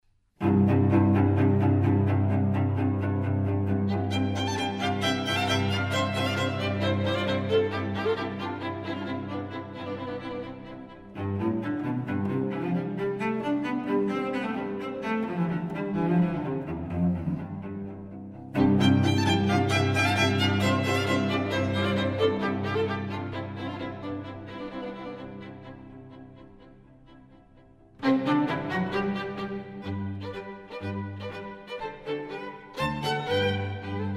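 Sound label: string trio; lachner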